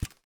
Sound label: sparks reel stop; netent